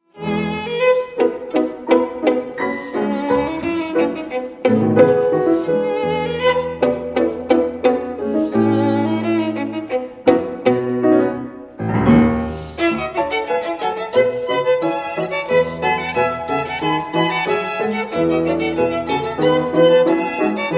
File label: rhapsody no 1 for violin and piano; bla bartk; sz 86